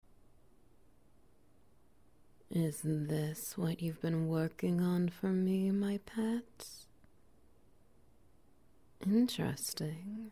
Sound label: Wet Noises